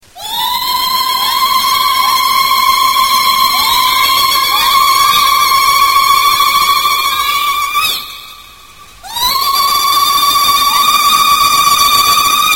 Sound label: Horror Effects